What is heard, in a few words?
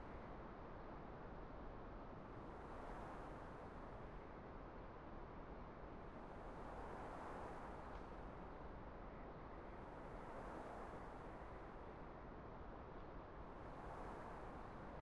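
ambience combat; highsilvermere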